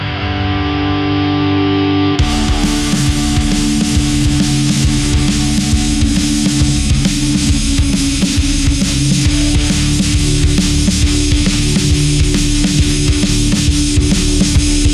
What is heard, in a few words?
inferno guitar assault, ringtones